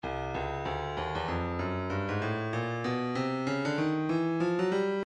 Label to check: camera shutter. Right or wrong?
Wrong